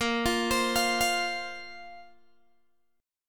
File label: Bb Suspended 2nd Bb down Guitar Open G